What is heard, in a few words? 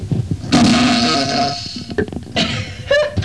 fart; was sent in by one of our visitors...I'm almost postive its real...but listen for yourself.